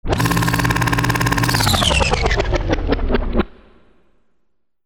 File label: CAMERAS